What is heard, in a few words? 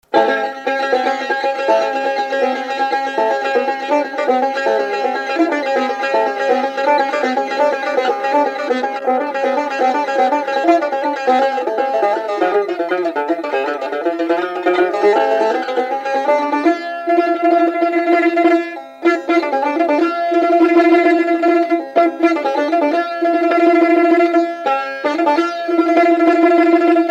chahargah